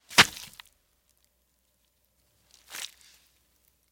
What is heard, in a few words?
Spear & Knife Into Body, Spear Jabs In Body, Short.